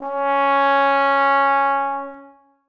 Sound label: tenor trombone c#4; Sonatina Symphonic Orchestra